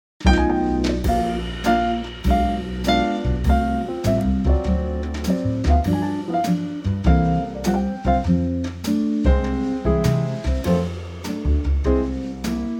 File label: quartet F